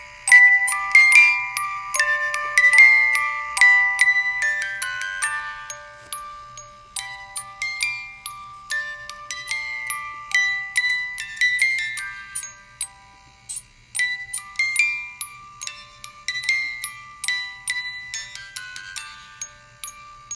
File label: Lullaby; Schubert